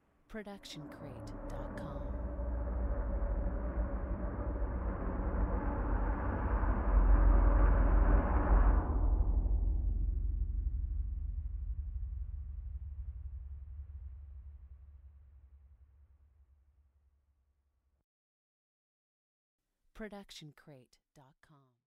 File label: Horror Low Rumble Riser; SoundsCrate